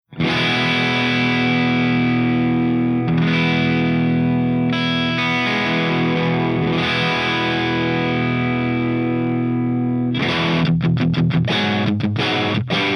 CELESTION GREENBACK P90

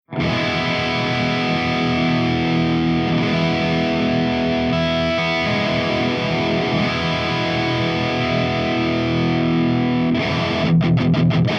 ENGL SOV CH4 LEAD V30 P90

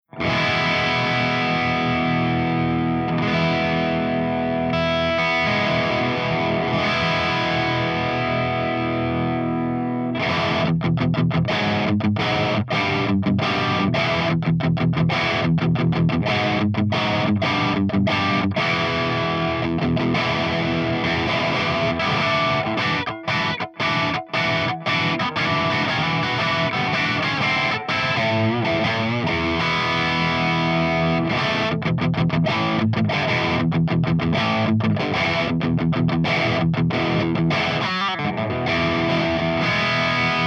EVH5150 CH2 CRUNCH V30 P90

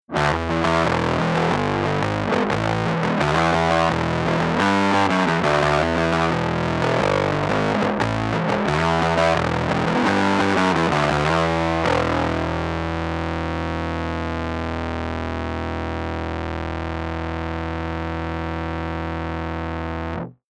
BIG MUFF

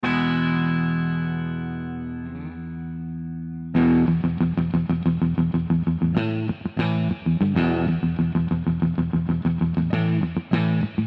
BLEND STEREO

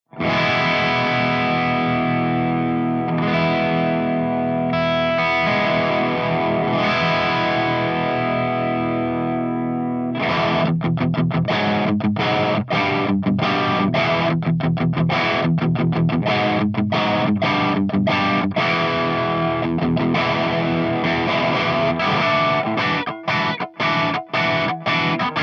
BLACKSTAR HT CH2 BRITISH V30 P90

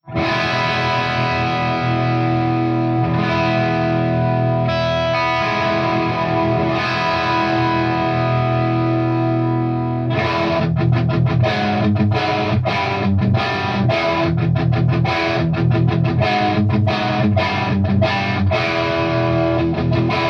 JCM ROOM

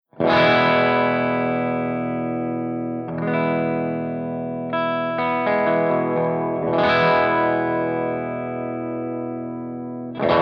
ROCKERVERB CH1 CLEAN V30 P90

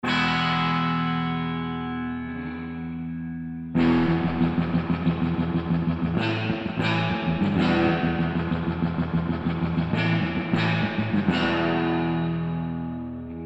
KENDRIK Medium

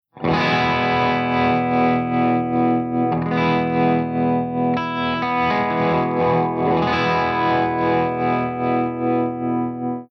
TREMOLO P90; BUCKINGHAM TREMOLO1

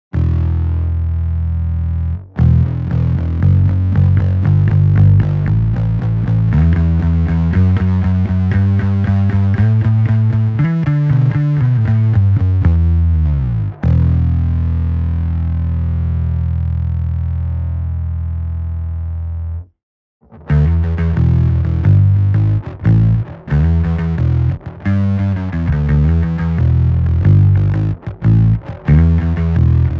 BigMuff BLEND

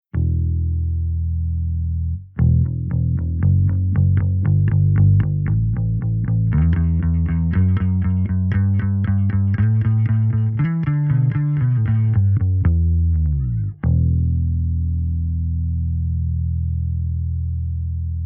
SVT LOW HIGH

